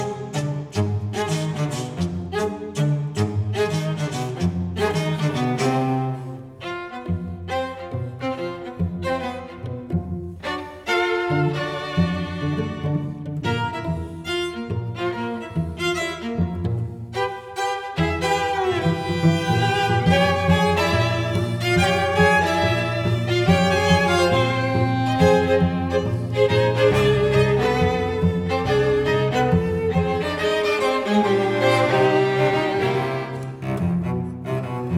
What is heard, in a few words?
String Quartet